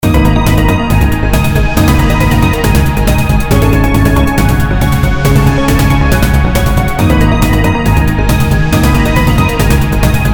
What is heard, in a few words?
very very sad